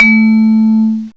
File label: VIBES, MALLETS; M8; CMI Factory